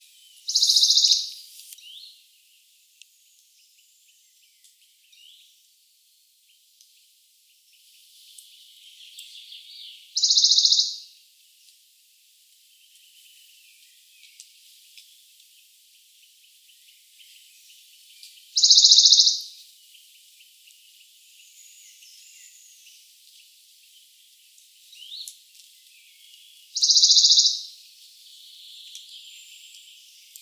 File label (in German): Berglaubsaenger; Vorderer Schwarzenberg OAL; Kilian Weixler